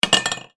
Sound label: metal pole small down on surface; zapsplat